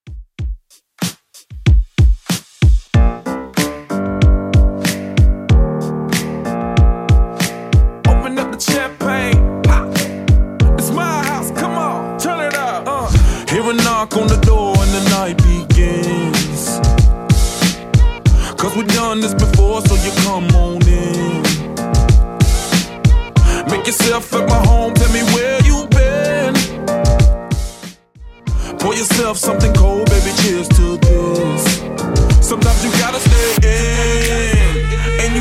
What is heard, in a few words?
94 bpm